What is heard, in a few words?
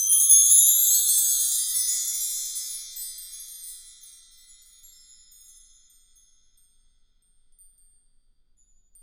Wind Chimes; Roland LCDP03 Orchestral Perc